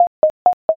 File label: cute step